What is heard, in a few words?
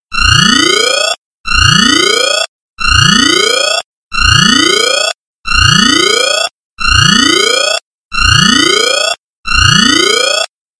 Science Fiction Alarm Sounds Car Horn